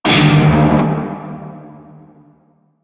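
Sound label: Shawzin Minor Chord E; Music; Warframe; Narmer Shawzin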